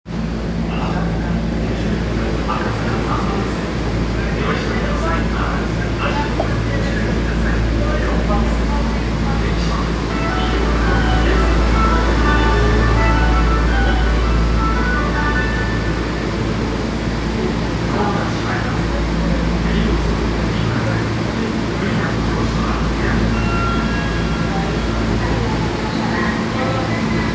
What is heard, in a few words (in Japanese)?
銀座線，丸の内線赤坂見附北東